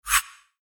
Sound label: roll